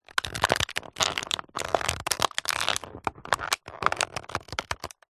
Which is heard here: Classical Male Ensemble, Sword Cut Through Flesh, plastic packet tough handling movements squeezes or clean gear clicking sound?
plastic packet tough handling movements squeezes